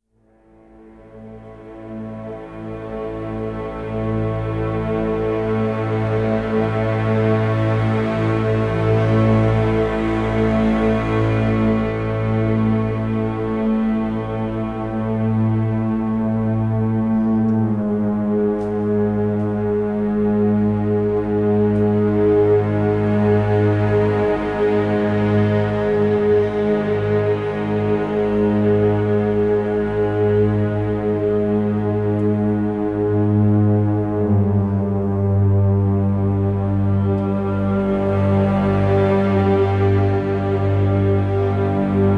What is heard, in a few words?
Adagio Monochord; Civ5; War